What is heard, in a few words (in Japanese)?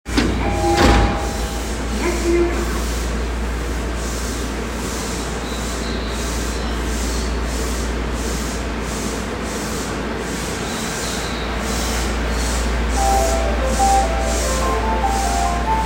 都営大江戸線東中野西, train sounds